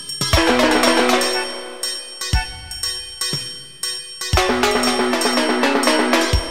old nokia tone; ringtones; rock

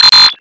Chirp alert

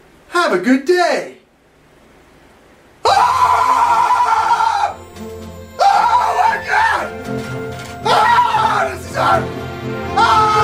beast scream

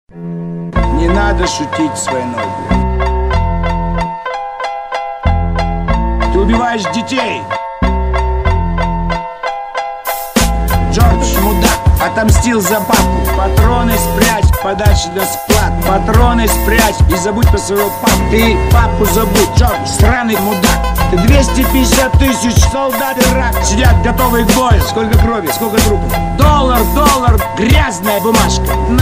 Russian remix

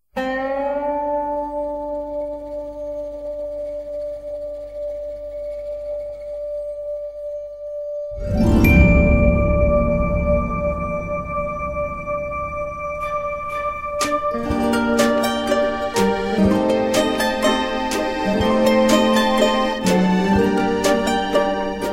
sleepy msg tone; ringtones; sms

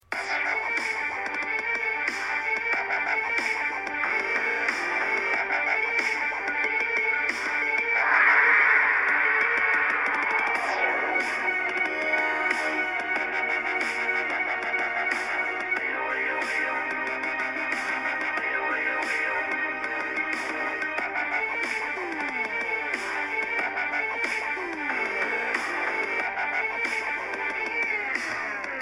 walking remix

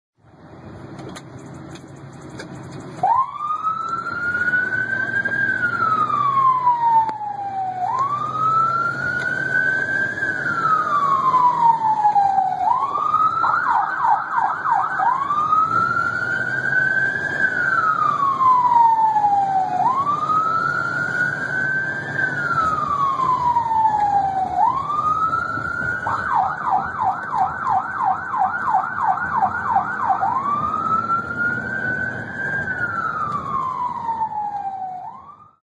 pov ambulance response code 3 at night

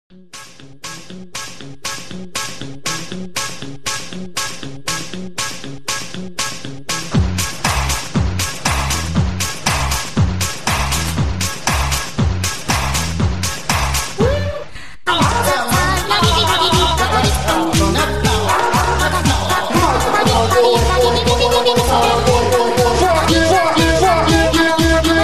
AAAAAH

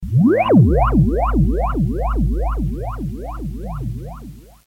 notification

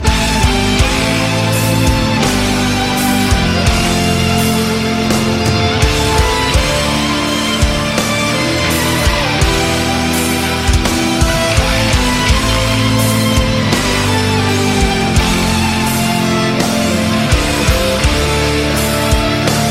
Symphonic Metal

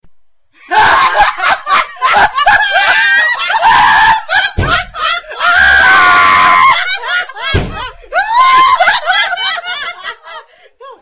Big Laugh Hahaho

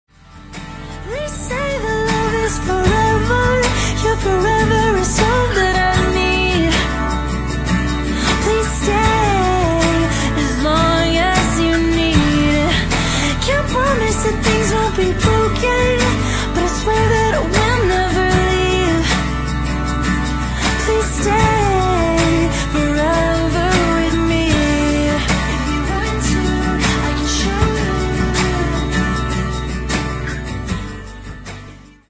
acoustic

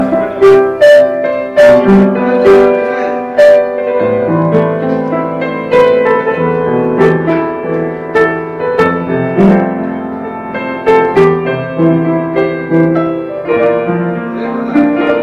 christian music

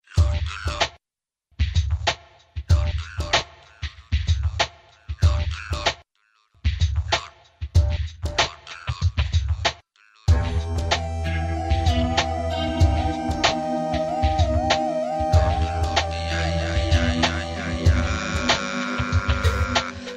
digital rock music

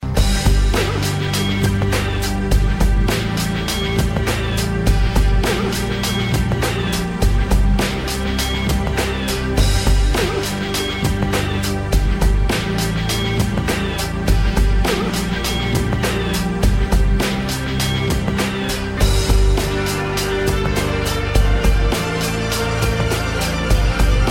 ringtone sound

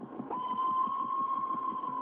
SIREN; ISO

